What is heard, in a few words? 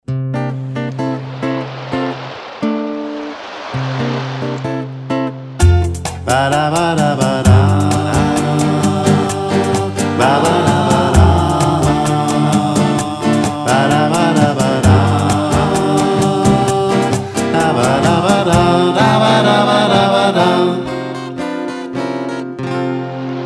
jingle; kroko